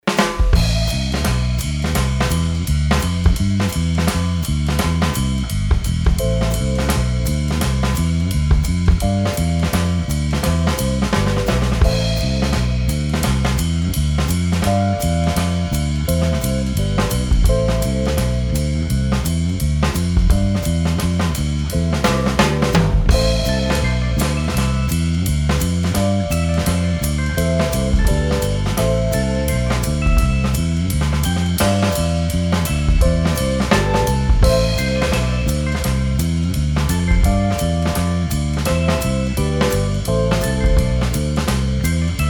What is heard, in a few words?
moody walking jazz, music